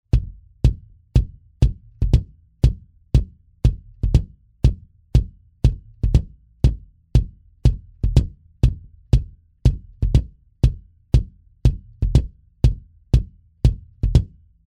V Kick Schlagfell Modern; sE Electronics

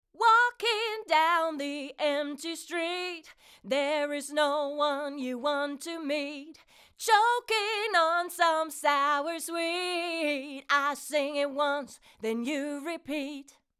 Vocal ohne 33609N; AMS Neve 33609N

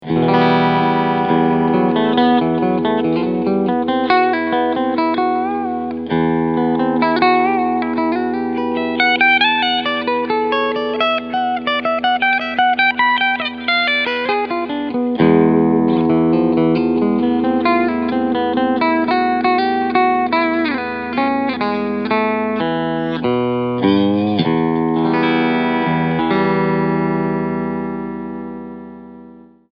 Schecter KM 6 Cleansound Steg SC Modus

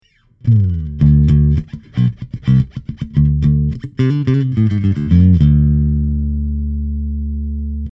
Markbass MB58R CMD 102 Pure Pick Badewanne Mic

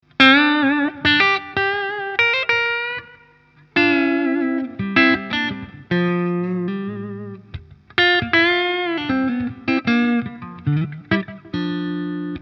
Framus Idolmaker Hals clean

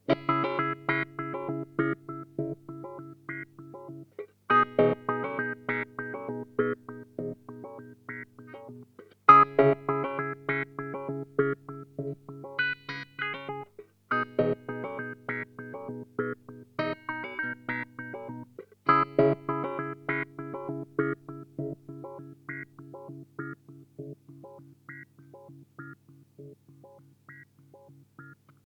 Boss SL-2 Attack und Duty auf 12 Uhr Dual

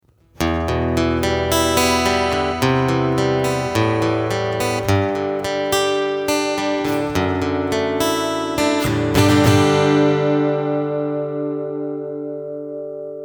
Acoustic EQ Kompressor Reverb; Carl Martin Acoustic Gig

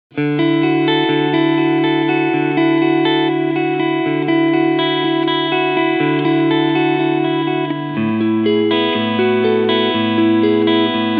Ibanez AZ2204 alle Cleansounds Normal Modus

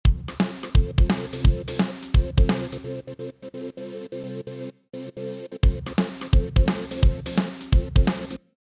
Sidechain Ghostdrums oeffnen Gate fuer Pad

